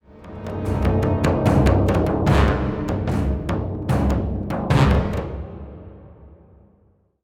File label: Stinger; Janalai